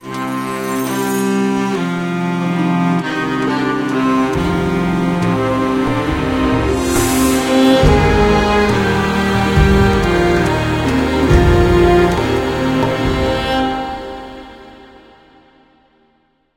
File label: music puzzle melody; lissandra